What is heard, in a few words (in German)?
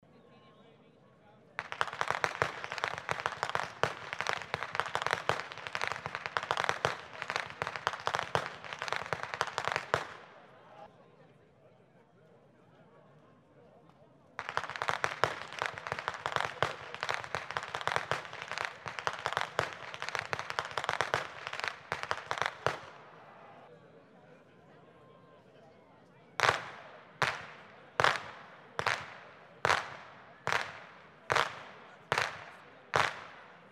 schnalzen, jugend; waging